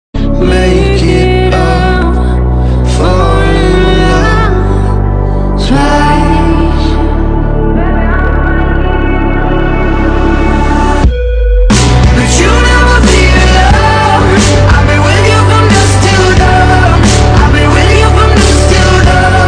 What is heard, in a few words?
slowed reverb ringtone, english ringtones